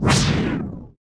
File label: plasma blade; ufoai; weapons